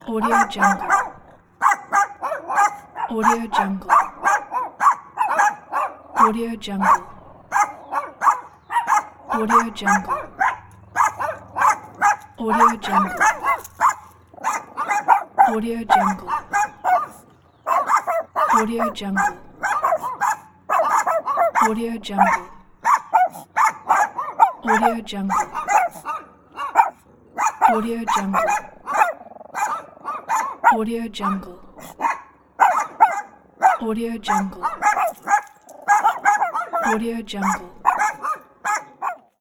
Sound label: group of dogs barking